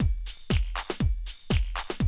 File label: 120, Classic Drum Loops